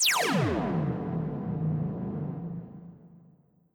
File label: Energy Conv Energy